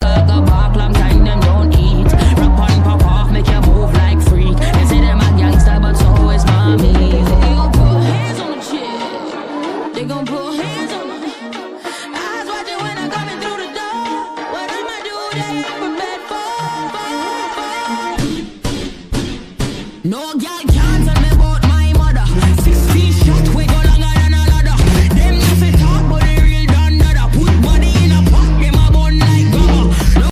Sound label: korean ringtone